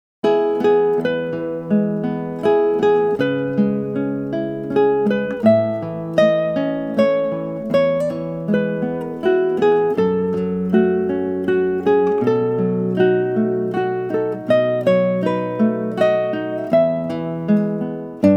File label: guitar lullabies